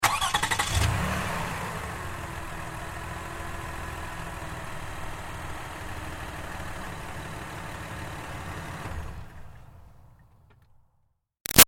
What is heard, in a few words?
Car Highlander Start Idle Off; 2005 Toyota Highlander V6; Cars; Vehicles